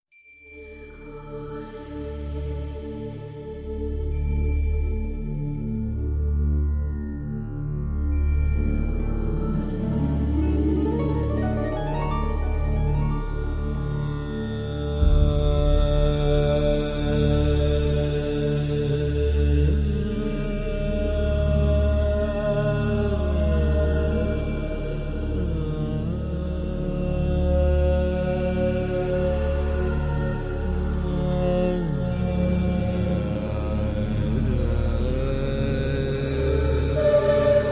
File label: Instrumental